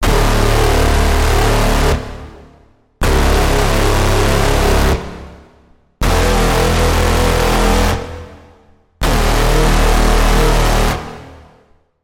Braam; Onslaught; Routed Sound; Vital Expansion - Quantum Enforcer Vol.1

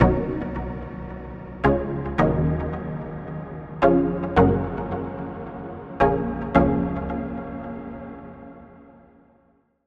Pluck, Spire; Mercury; Routed Sound; Spire Expansion; Star Scavenger Vol.1

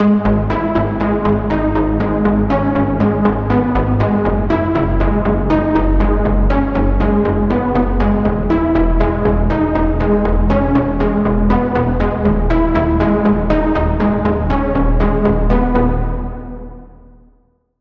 Lead - Reverse Time; Routed Sound; Vital Expansion - Quantum Enforcer Vol.1

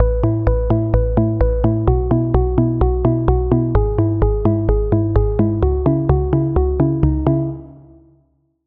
Pluck; Routed Sound; Sylenth1 Expansion - Flame Summoner Vol.1

